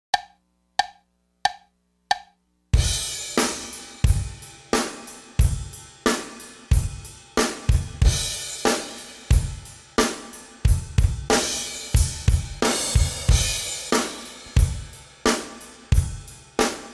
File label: crash this, drums